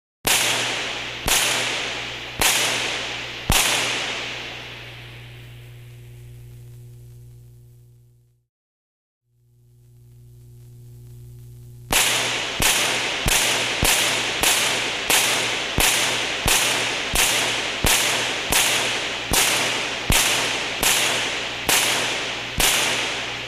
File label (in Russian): razrjady elektricheskoj dugi